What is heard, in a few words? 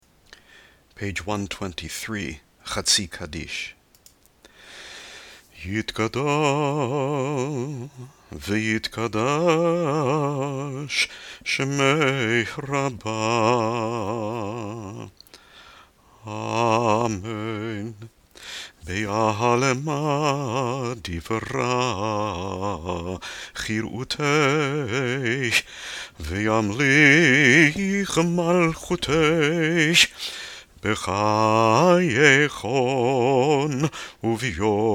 hatsi kaddish before musaf; high holy days